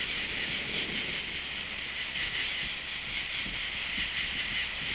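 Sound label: insects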